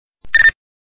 Nextel Chirp

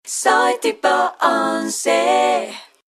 A Capella

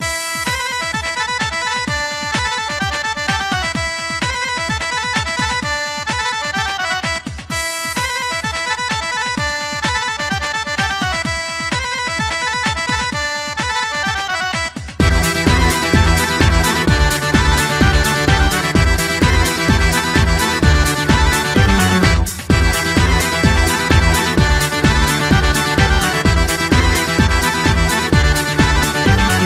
Albanian Music Mix

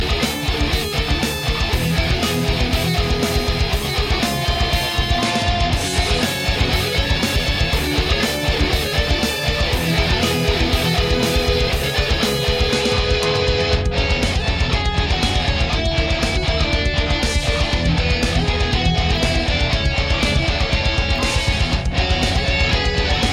Metal

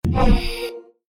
Portal; Astroneer

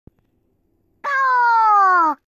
rawr; Rin Hoshizora

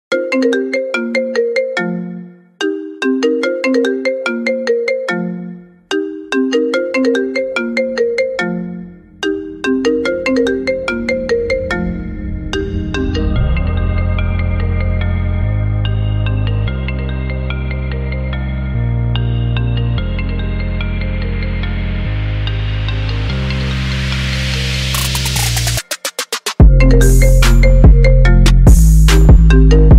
iPhone trap remix

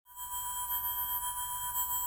Sonic Screwdriver; Sonic Screwdriver 2

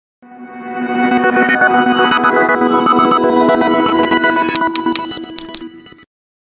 Msg Tone

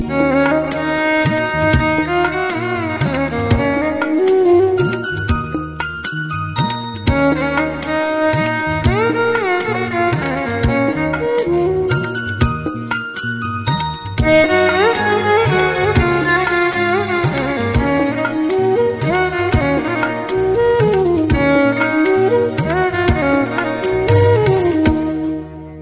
Violin

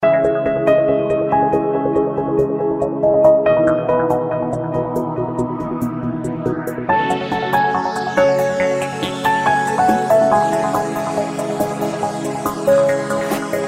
Melodic Trance

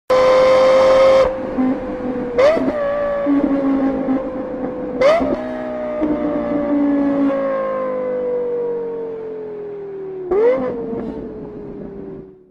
Lexus Lf-a Downshift

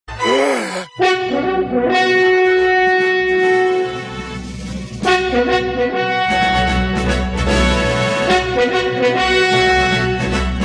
Horn of Urgency

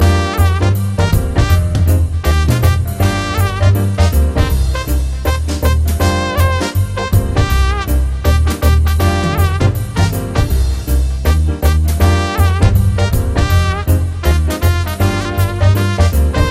Trompet Sms

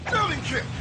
Building Kick

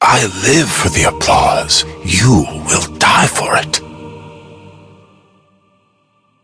Trap; Jhin Lotus

